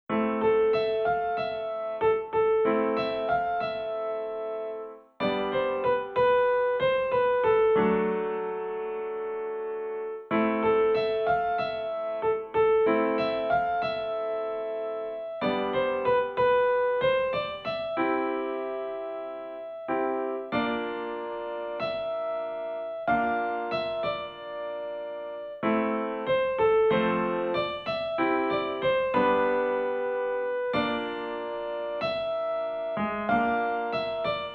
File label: BLACK SHEEPS (piano); Gore Screaming Show